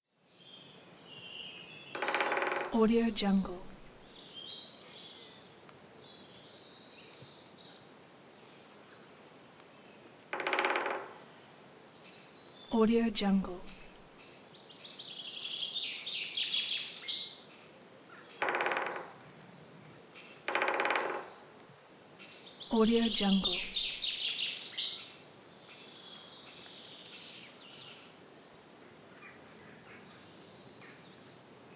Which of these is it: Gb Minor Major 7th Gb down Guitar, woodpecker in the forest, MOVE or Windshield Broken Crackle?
woodpecker in the forest